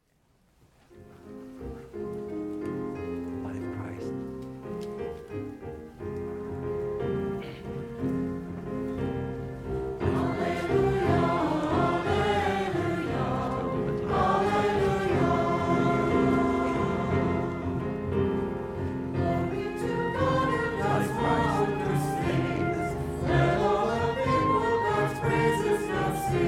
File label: Easter Alleluia; Easter Vigil 2017